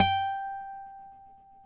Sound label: NYL G, FLAMENCO NYL; Spectrasonics; Hans Zimmer Guitars VOL-2